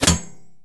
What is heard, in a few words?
Bullet Impact Metal Light